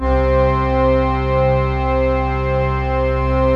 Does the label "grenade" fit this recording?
No